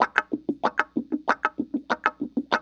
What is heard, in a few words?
WAH PATTE, Funk Gtr & Wah